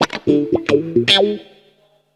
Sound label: guitars; PS-28 Vocal House Samples